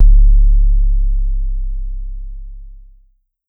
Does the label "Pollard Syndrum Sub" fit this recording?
Yes